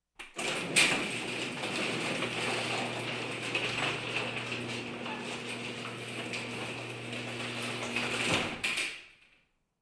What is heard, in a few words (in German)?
garagentor schließen (automatisch); Auto-Zweiraeder